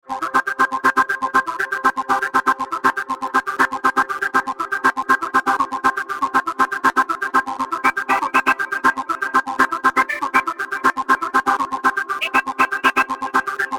Chords 120

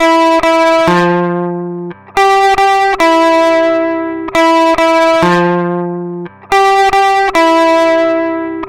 Gentle Riff Mid Booster

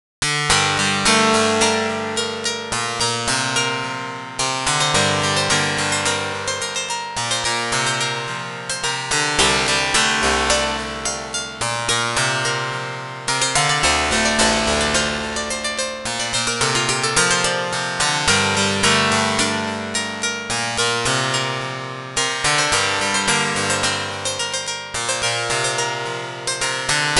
in Gm, synthesizers

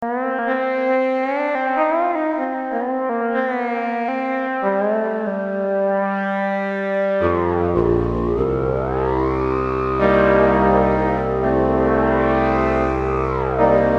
formantik lead bass, synthesizers

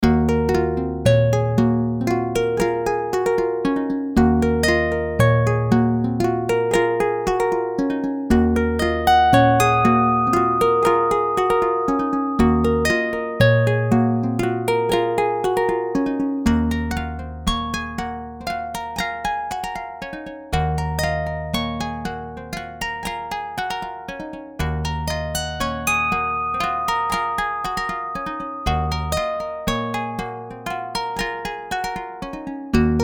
harmonics example

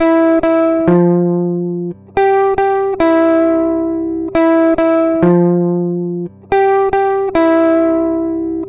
Gentle Riff Old Amp

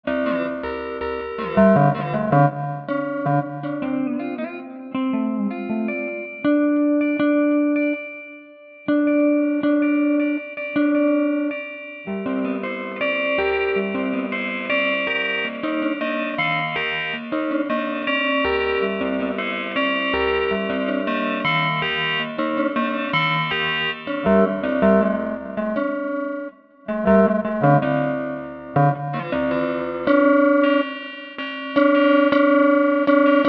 guitar ring

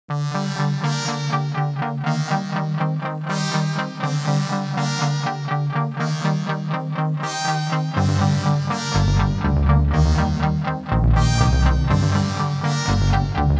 with drums

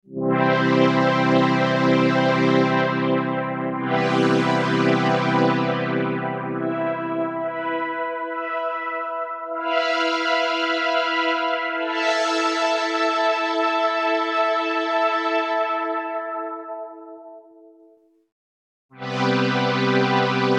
solina LP env, synthesizers

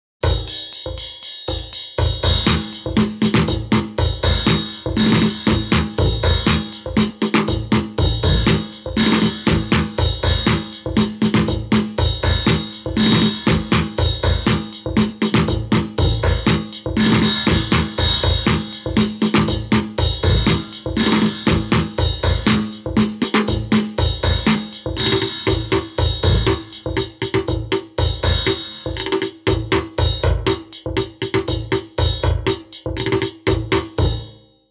kit, synthesizers

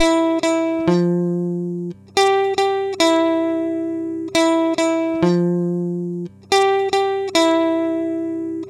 Gentle Riff Acoustic

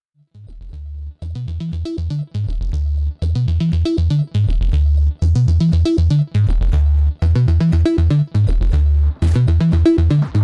anabass

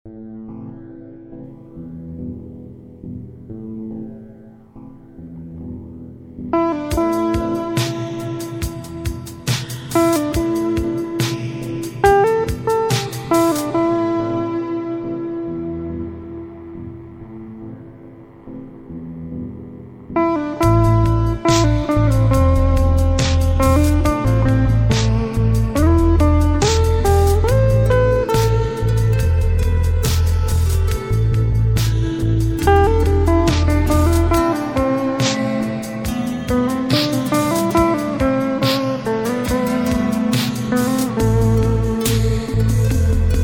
reggae guitar, synthesizers

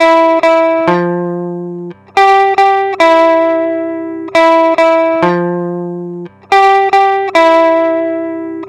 Gentle Riff Small Amp

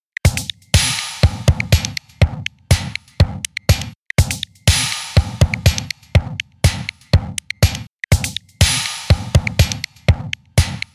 pendulate drums loop

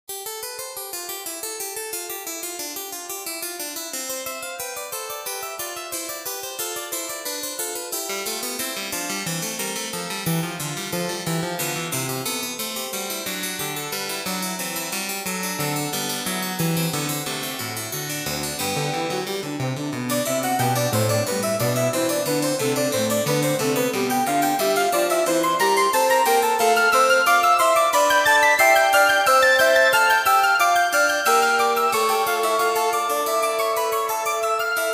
plays Bach clav flute; Modelonia

